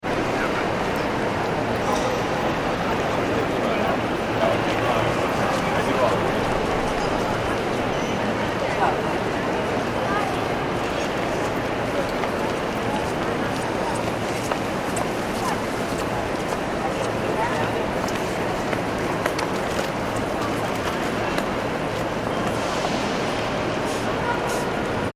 ambience train station concourse; Train Station Ambience - Grand Central Terminal ( New York City ) Main Concourse; Train Stations; Backgrounds; Grand Central Nyc; McKinney Sound

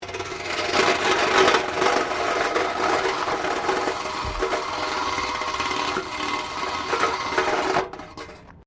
METAL BINLID SCRAPE WIRE FENCE; Materials

